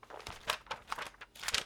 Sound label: book page turn